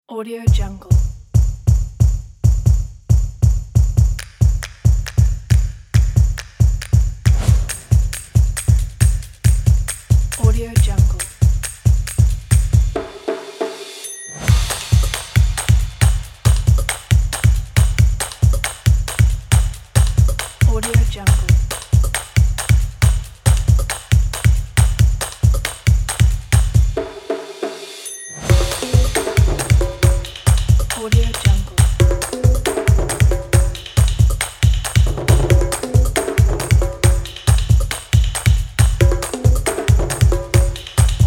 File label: upbeat rhythm